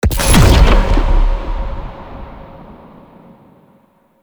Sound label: Hazard Fracture Disable; Warframe; Duviri; Undercroft Hazards